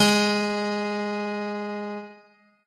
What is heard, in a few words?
piano; pianojs